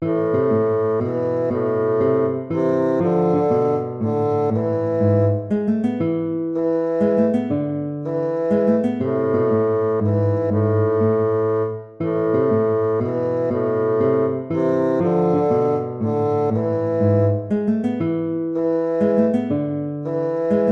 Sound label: baroque minuet minor